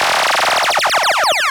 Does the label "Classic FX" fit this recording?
Yes